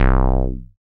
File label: SYN TB SUB, Roland TB-303; TB303+MC202; Sound & Vision - Gigapack I CD 2